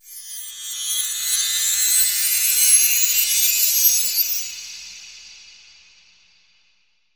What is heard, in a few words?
Bell Tree; Ghosthack; SkyRealm